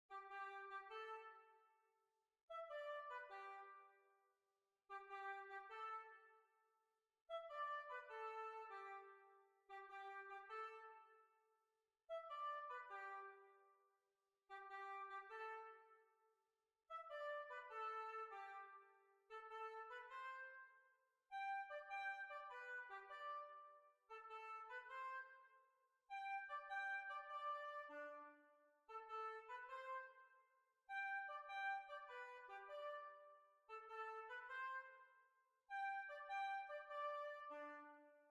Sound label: Jig